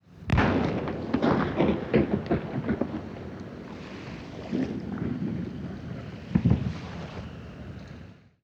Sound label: Nine Oclock Gun